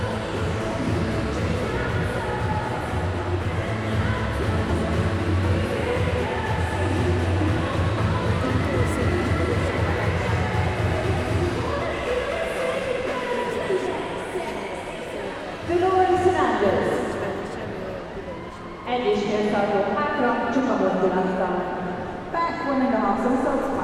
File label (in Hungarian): mu ugras a kakasulorol; vizes vilagbajnoksag 2017